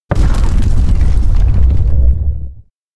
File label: elec earth burst; anemoi